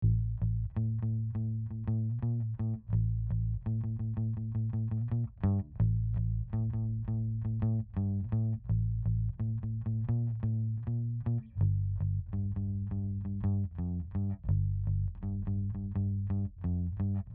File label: ELEBASS OUT